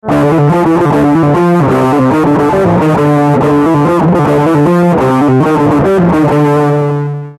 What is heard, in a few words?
marshall high g riff